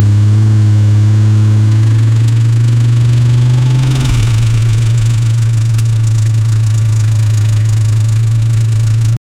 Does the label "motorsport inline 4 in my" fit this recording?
Yes